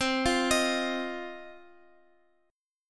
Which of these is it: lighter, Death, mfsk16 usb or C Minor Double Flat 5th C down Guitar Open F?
C Minor Double Flat 5th C down Guitar Open F